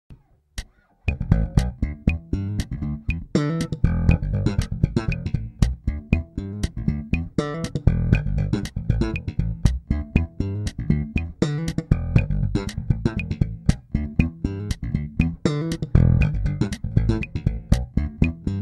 Slap line w DT in E7

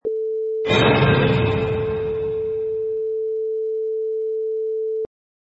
Orchestra Horror Sting

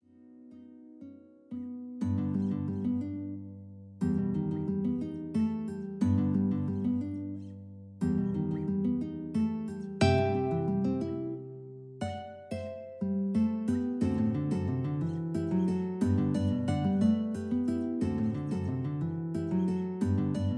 Petite Suite Guitar Trio

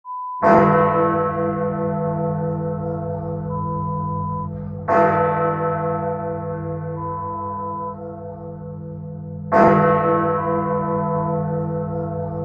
Big Ben

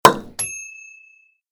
Champagne Cork Pop

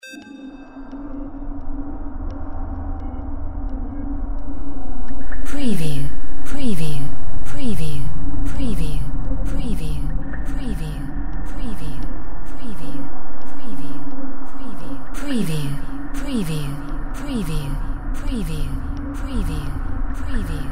drone lo morph pulse fx

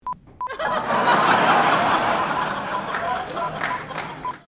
Laughter

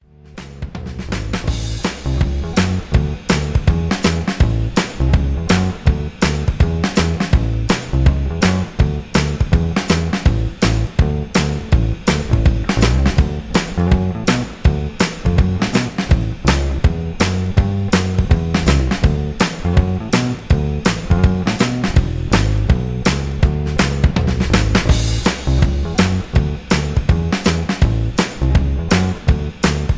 Lets Play Guitar

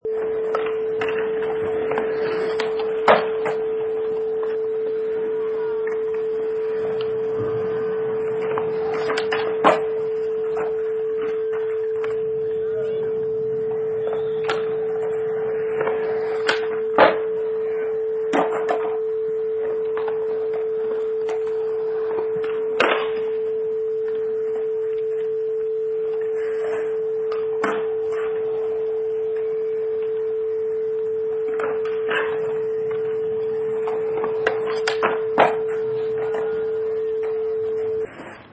Skate Park

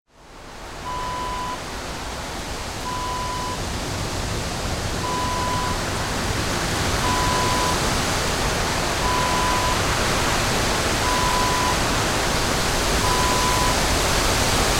wind breeze

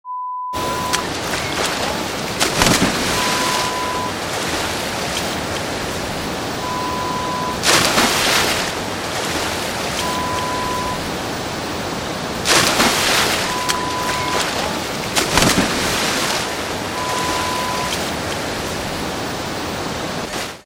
Splashing, Diving sounds

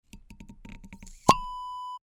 wine bottle cork pop

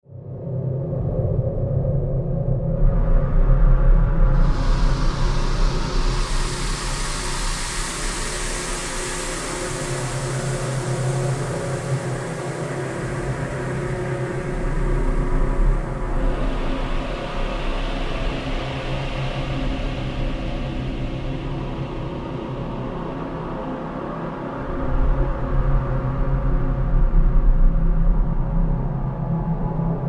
Sci-Fi SFX

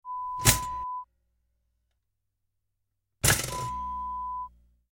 Toaster sound

